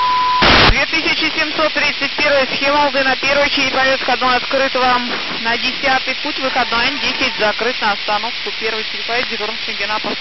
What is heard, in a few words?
Russian Railway, NFM; 2130khz; Russian Railway Dispatch